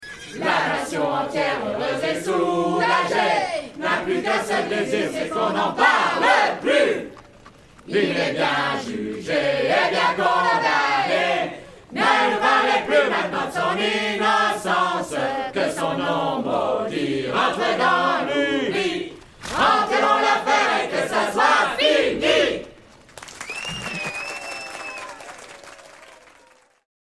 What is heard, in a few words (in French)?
CHANT DE RUE; DREYFUS